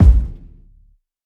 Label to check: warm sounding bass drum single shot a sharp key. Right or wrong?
Right